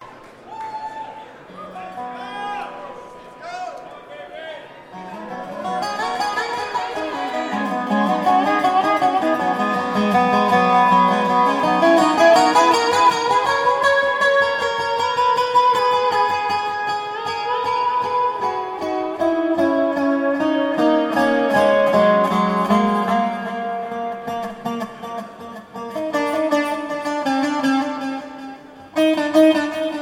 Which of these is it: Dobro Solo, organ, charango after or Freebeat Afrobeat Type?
Dobro Solo